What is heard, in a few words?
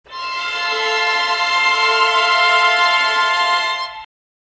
Transition, music